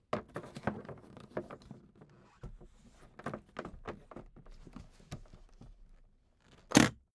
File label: suitcase handling soft